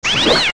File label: Die; Bari; zelda; OOT